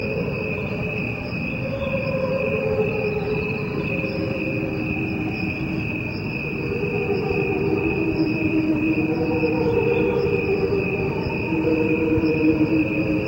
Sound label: cricket raw